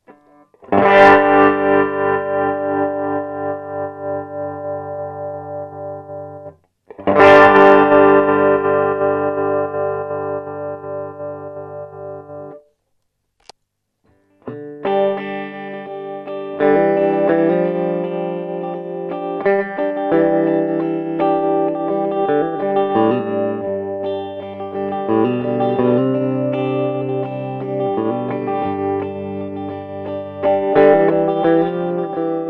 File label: TREM, guitar